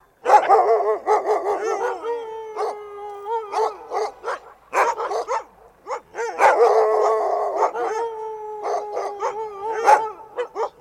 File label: Dog Barks Howls, dog